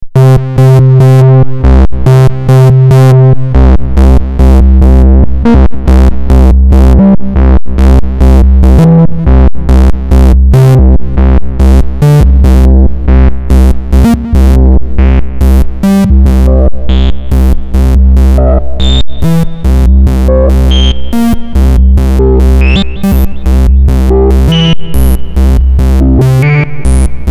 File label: Bass and Hold